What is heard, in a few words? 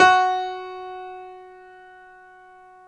9FT GRAND; OMI - Universe of Sounds